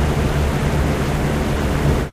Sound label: booster; techage; Joachim Stolberg